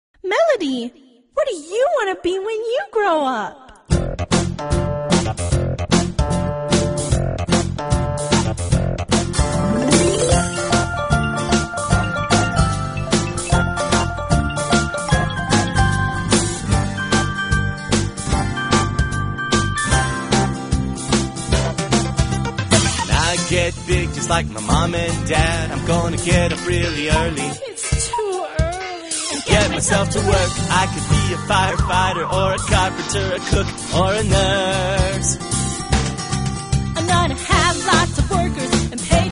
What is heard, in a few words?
melody